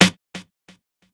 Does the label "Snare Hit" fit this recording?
Yes